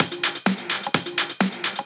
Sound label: Clubhouse Loop 128 BPM